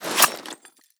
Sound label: draw, wpn